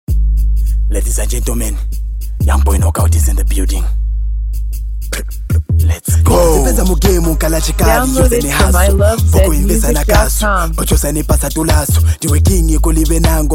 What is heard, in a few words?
Hardcore Rap